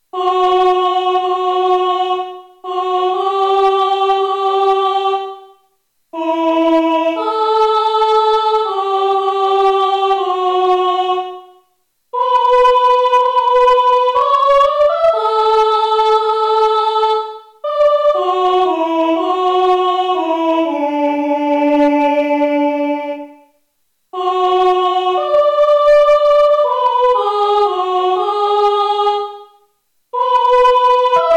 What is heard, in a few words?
soprano; voix synthétique